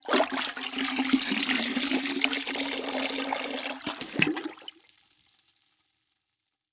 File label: Liquid Water Mop Lifting Out Of Water Dripping And Submerging Into Mop Bucket